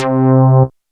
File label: Polypalette, old synths; Rubber Chicken Software Sample Bank